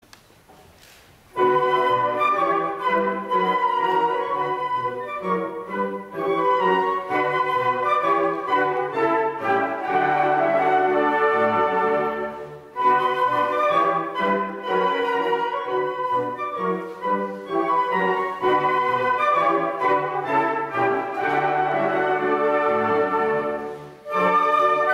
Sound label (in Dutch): Fluit